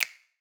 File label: snap